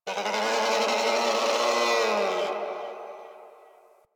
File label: Landscape Distant Creatures, Ambience; Warframe; Venus Open World; One Offs